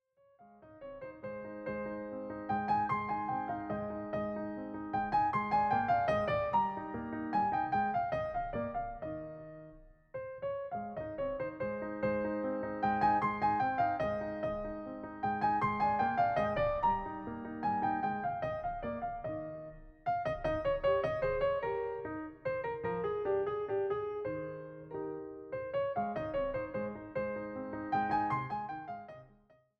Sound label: Keyboard Sonata in A-Flat Major, Menuetto; No. 35; Hob. XVI, 43; haydn; piano